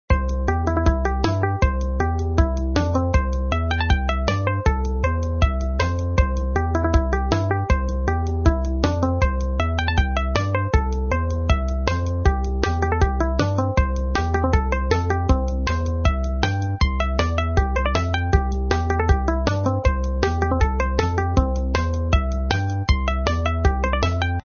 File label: Loop Fast; Fruit Smash Online; Windows